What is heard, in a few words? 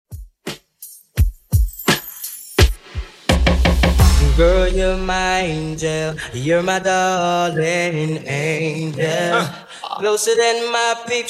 2ks Redrum Epic Intro 85bpm